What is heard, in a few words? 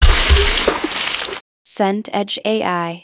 swimming pool dive